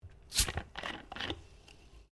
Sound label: Bottle Plastic Cap Plastic Screw Off; Various bottles; Plastic (Sound Effects:Materials)